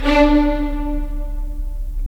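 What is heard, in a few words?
strings violins, left; Roland - LA Composer vol. 4; 90 s Sample CDs; Violins Sh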